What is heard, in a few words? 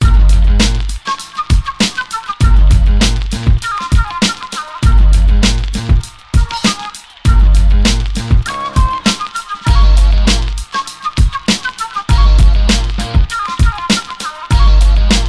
beat